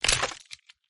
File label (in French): squelette mort